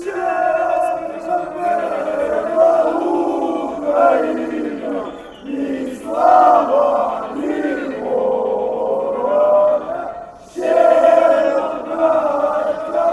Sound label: metalist chant